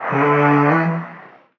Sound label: vocal awaken Em; Slate; AURORA Sample Pack; Vocals